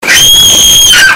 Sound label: spoopy anime song